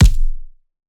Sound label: Punching Perc, Straight Glove; PROCESSED; 99Sounds; Punching Percussion